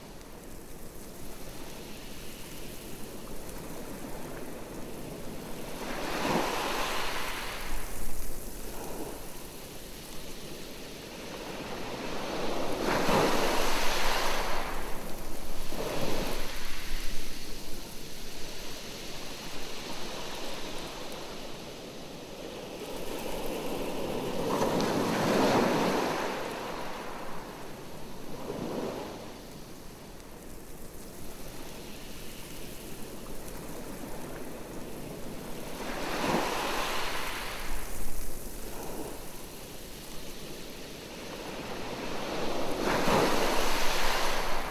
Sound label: beach wind